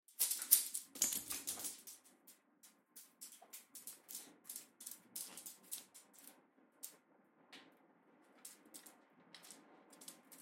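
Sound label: dog running on the floor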